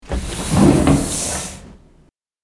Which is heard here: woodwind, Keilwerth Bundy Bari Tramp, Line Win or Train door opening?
Train door opening